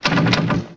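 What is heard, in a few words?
Bow Release Multiple; Civ4 warlords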